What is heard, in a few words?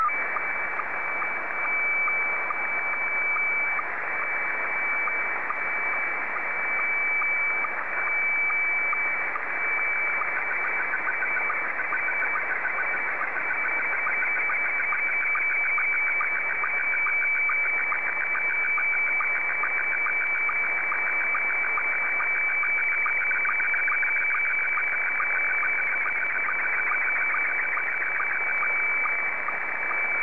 sstv; ZL2TJM